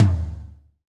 TOM TOM, Roland

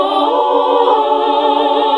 SING, CLASSIC VOX1; Best Service; Hallelujah

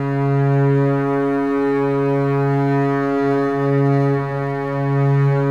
Contrabasses C#2; Best Service ProSamples vol.51 - Classic Orchestra 2

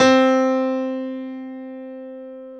PIA GRDPI, PIA_GRAND, Roland; Sound & Vision; Gigapack I CD 2; PIA Grand 5

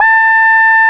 QUAC, QUACK SOLO, Synth

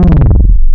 FB Sweep, wavetables; Animoog

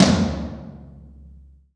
TOM; Best Service Gigapack 2

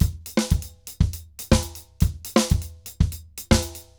DRUMS, SAMBA; Best Service ProSamples vol.14; AKAI

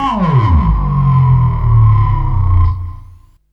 FX, Drum & Bass

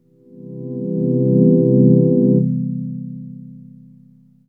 voice, dx7

